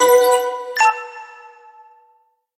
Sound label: Message; Ingress